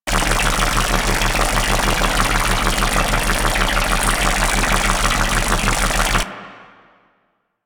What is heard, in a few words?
Link Beam Loop; Warframe; Warframes; Trinity